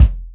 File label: Yamaha CS6, Drums